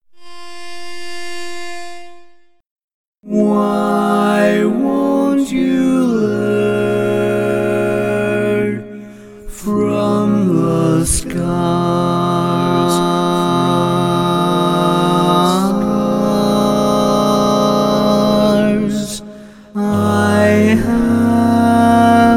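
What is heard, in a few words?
Baritone, barbershop tags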